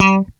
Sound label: PHAS G4, CHRS GTR; East West - Global Sounds